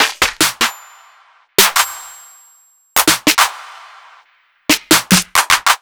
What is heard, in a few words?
808 trap Snares Claps